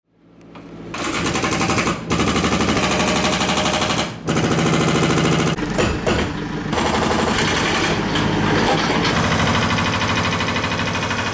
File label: construction work with hilti